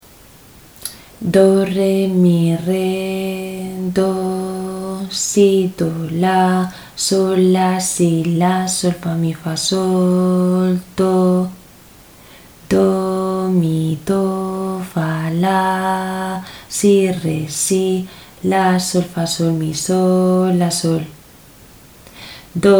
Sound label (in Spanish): ritmo 6-4; Mixto